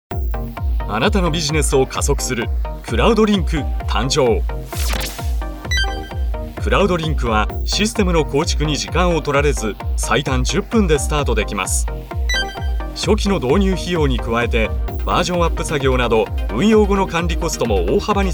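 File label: crowd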